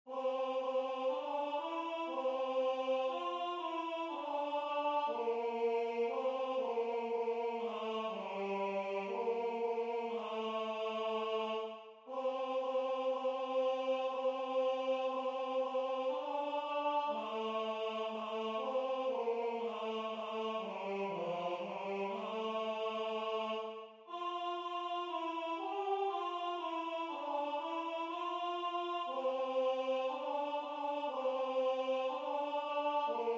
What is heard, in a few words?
Alto